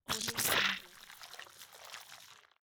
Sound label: organic horror interface fleshy notification